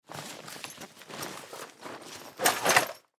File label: Ambulance Supply, Ambulance Supply Bag Set Down Metal Shelf; Emergency